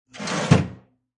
A cash register is closed.; Cash Registers; Bars; Cash Register Closes

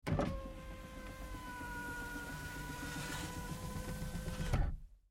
GMC Savana, Power Window, Up, Truck; 2010 GMC Savana; Vehicles

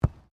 RUGBY BALL BOUNCE; Various rugby sounds; Miscellaneous Sports (Sound Effects:Sports)

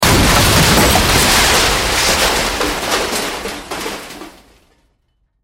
Explosion Crash Debris Large; Military; Warfare; Explosions

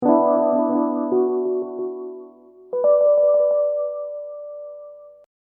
Hip Hop Dark Rhodes Licks 90 Bpm Straight E

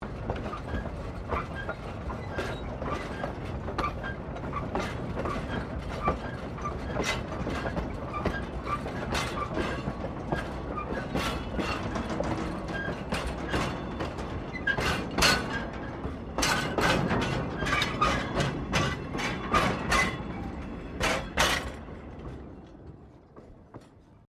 Steamtrain Trucks Rolling; Bellarine Tourist railway vehicles; Trains

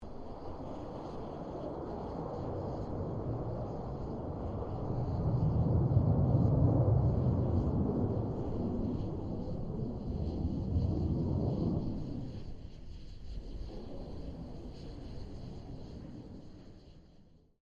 AIRCRAFT FA-18 HORNET PASS DISTANT; RAAF FA-18 Hornet; Airplanes; Vehicles

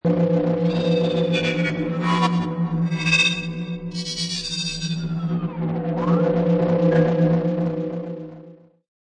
Freaky Noiz, Creepy background tone.; Freaky Noize; Scary Soundscape Sound Effects; Sound Effects:Horror

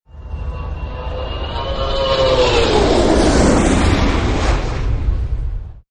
Incoming Rocket, Mortar; Guns

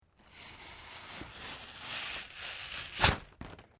PAPER CUT LONG; Material components for constructing more complex sounds; Paper (Sound Effects:Materials)

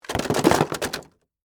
Foley, Cardboard, Box, Objects, Drop, In

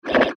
Gear Multimedia; Web Interactive; Technology; Noise; Electronics; Blastwave

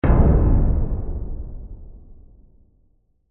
Hit Phase; Hits; Sound Design; BlastWave

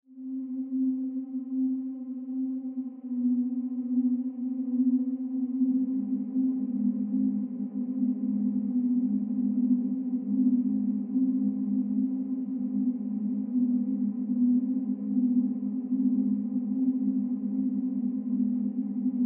Horror Drone Ethereal Tonal Swells Dissonant; Scary Tone; Blastwave

